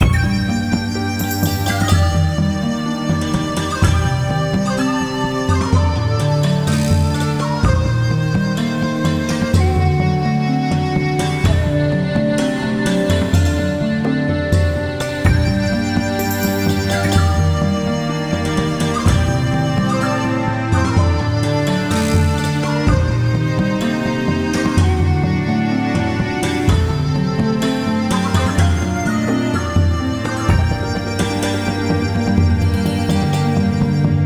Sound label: log in long; mir2; Return of Legends